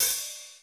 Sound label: DRY HAT; Crypton - DrumBase5000; DRY KITS